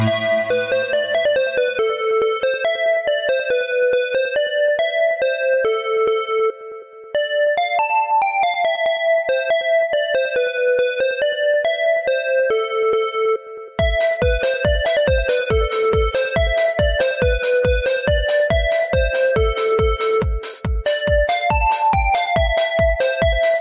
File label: Tetris Remix